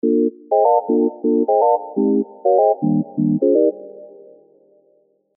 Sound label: house stabs, serum 2; Fashionista; alonso saloon serum 2 house stabs